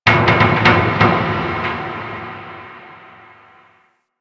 Ocean Underwater Metal Creaks Large; Ambience; Warframe; Grineer Ocean

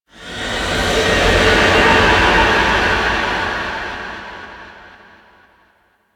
Rising Vocal; Props; Ropalolyst; Warframe; Ambience; Sentient Devourer

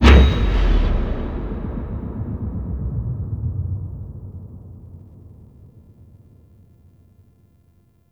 Activation Stinger; Entrati Labs; Grimoire For Gargoyle

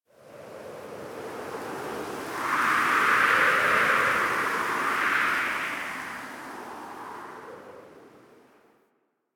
Camp Wind Gust E; Warframe; Quests; TheNewWar; Ambience; Drifter Camp

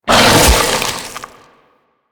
Infested Bone Blade Scatter Shot Fire; Warframe

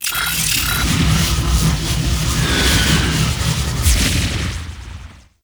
Teleport; Warframe; Infested Eris

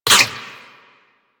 Ricochet; Warframe; Cowgirl

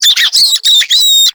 Infested Minion Bark, Vocals; Warframe

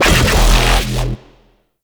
Infested Shotgun Growl; Weapons

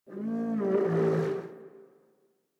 Venus Landscape Mid Creatures; Ambience; Warframe; Venus Open World

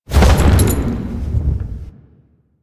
Horse Jump Heavy Double; Foley Actions; Warframe; Vehicles; Duviri

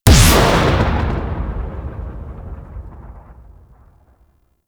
Bubble Explosion; Enemies; Abilities; Warframe; Teralyst; Rainalyst

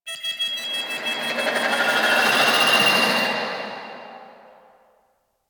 Swarm Warning Pre Pulse Alarm; Warframe; Entrati

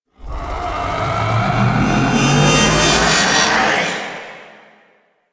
Backstab Sword Hover; Warframe; Warframes; Pax Duviricus

